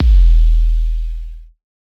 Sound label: 808 Kick Modulated Hit